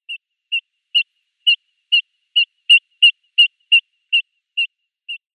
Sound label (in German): Mornellregenpfeifer Charadrius morinellus